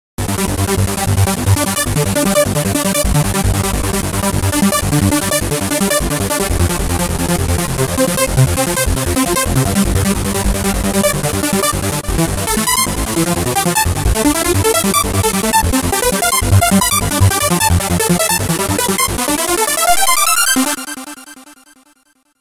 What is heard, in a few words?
Trance Arp